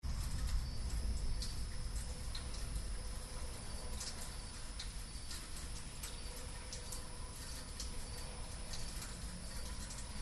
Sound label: Binaural Rain Storm